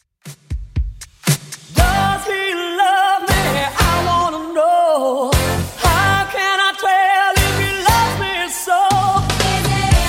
90s DJ Friendly Redrum 118bpm

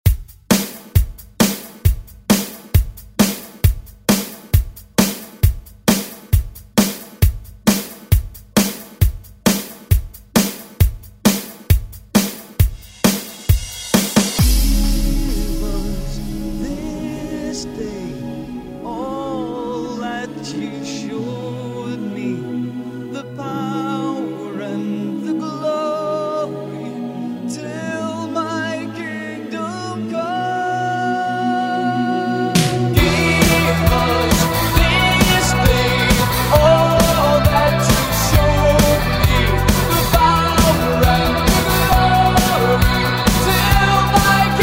80s ReDrum; DJ Mhark

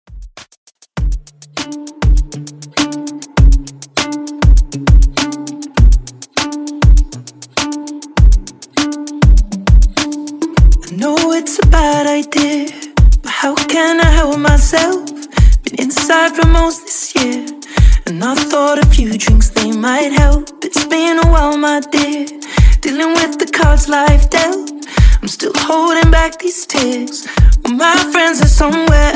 ReDrum 100 BPM

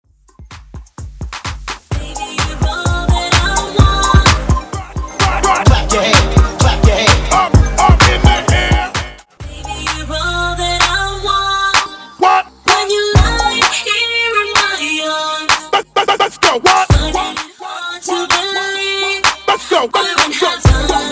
Hype In Acap Out Epic ReDrum 128 BPM

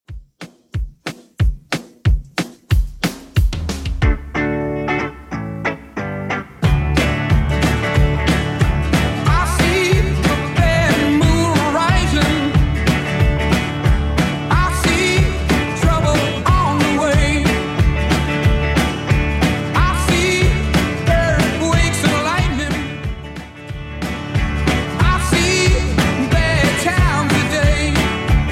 60s Redrum 183bpm

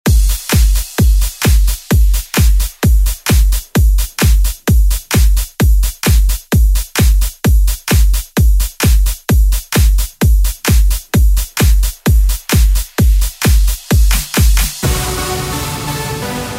Pop Redrum; Deejay Hit

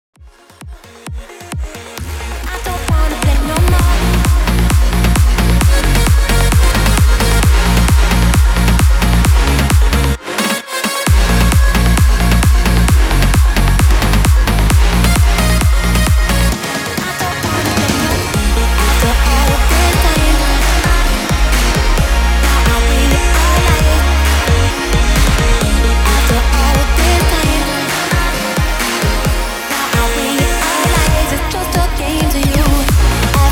132 BPM

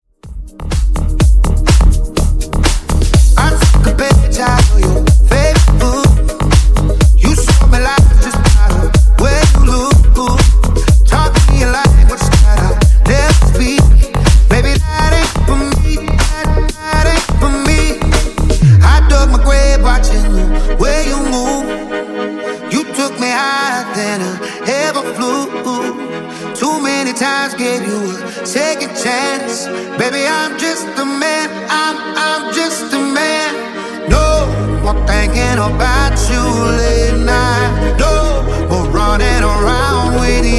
Remix Clean 124 Bpm

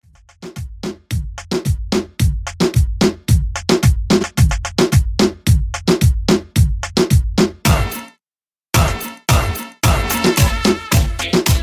Party DanceHall 110Bpm

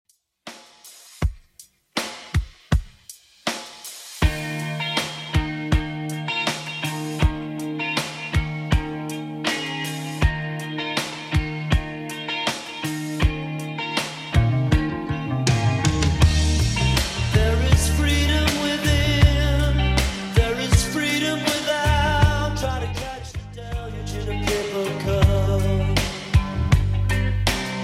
80s Redrum 80bpm

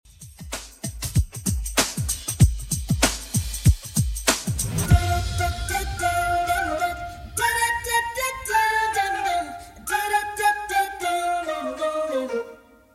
90s Redrum 96bpm

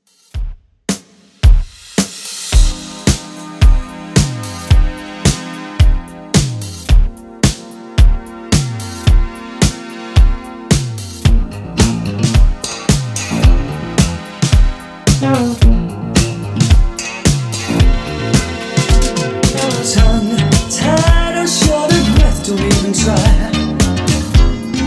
80s Redrum 110bpm

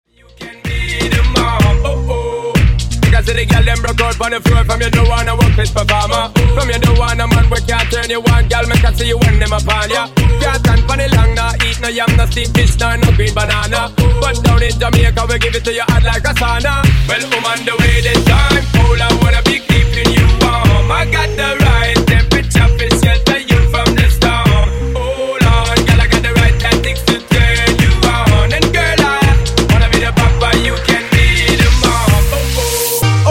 Transition English 126 130Bpm

